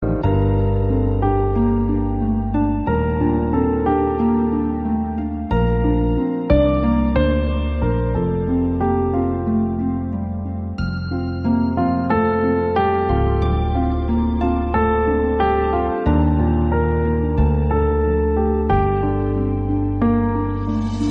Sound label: ringtone